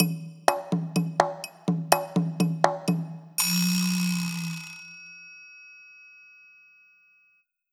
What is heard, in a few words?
percussion rhythm congas triangle vibraslap